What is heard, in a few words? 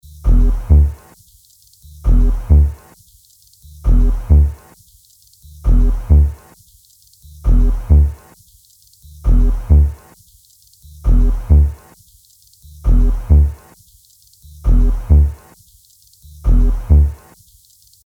Groove